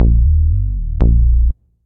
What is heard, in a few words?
Bass (Bb) 118bpm; Music Loops; Ableton; Singularities